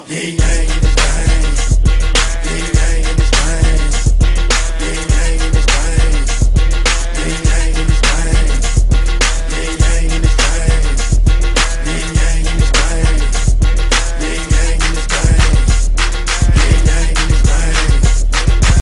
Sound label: Whistle Twerk